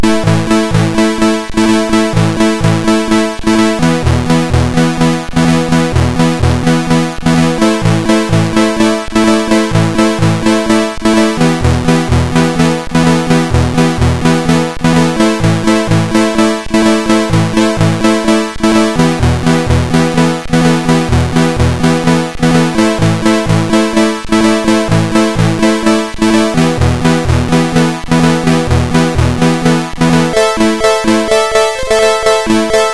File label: MOS6581R2, sid; Only for SLS